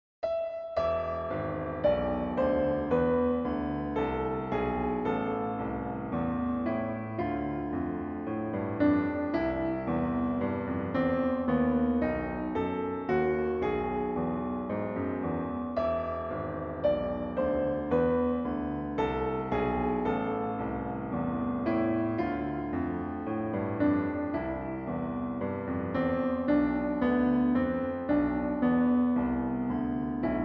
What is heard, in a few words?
sonata organo